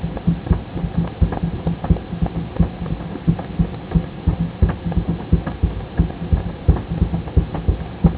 percussion

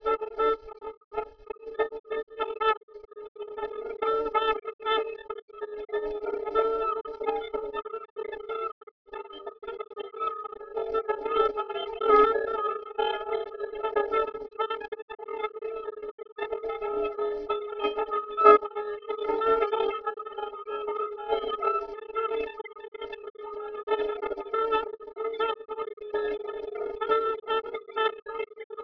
Jazz

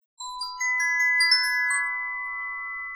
denoising glock 20dB mix

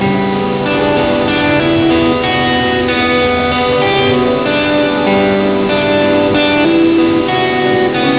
musical

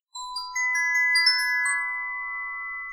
denoising glock 0dB mmse

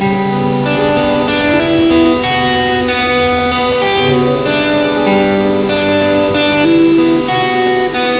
musical

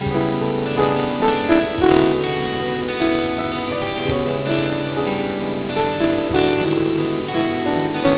musical

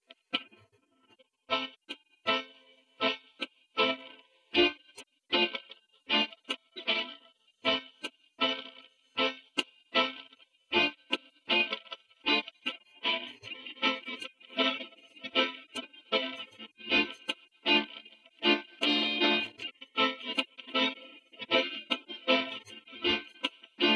guitar

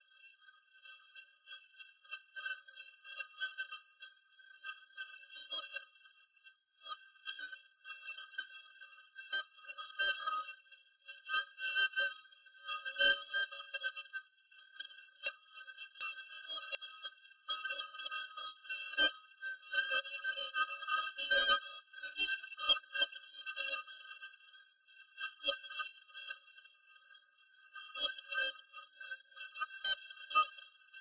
Jazz

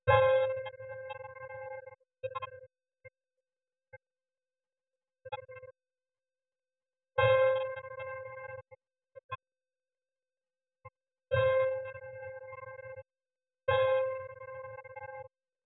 piano 2 layers

